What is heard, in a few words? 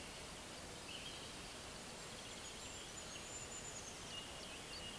Regulus regulus